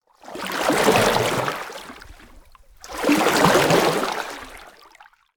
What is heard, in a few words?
rowboat single